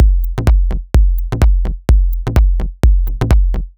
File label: A 127bpm, House Drumloops; 90 s Sample CDs; Zero-G House Fabrik Drumloops; ZG Drumloops